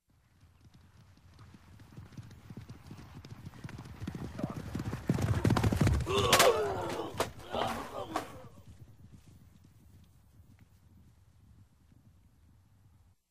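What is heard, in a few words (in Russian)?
звук битвы на лошадях двух рыцарей на турнире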